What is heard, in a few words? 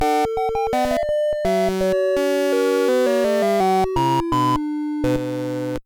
Alert; Pirates